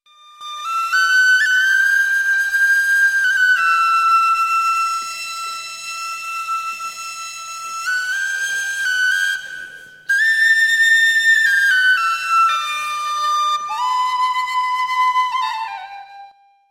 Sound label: Instrument